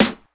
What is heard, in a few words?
snare